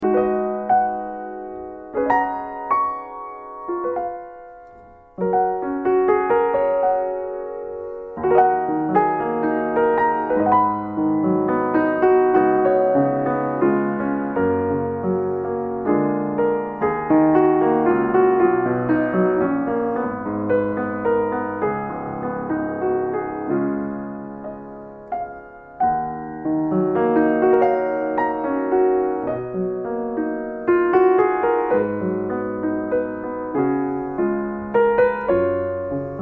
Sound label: Kawai K200, piano